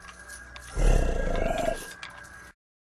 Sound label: Rat stun